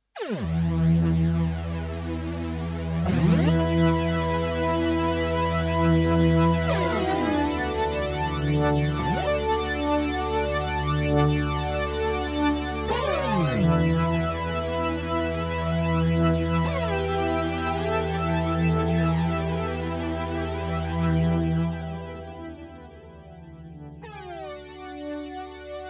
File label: 80s Poly Glide